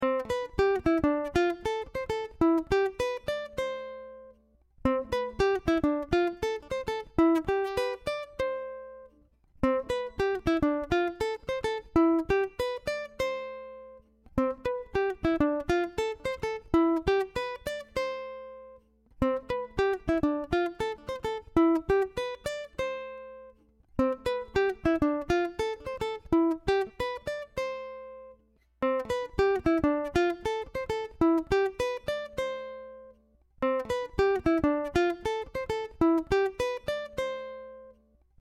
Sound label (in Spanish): C Melodia Ejercicio Solo